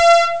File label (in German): floete; ST-XX Amiga Soundtracker Sample Packs